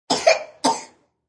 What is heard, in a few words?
Child Cough, A child coughs; Sick; Human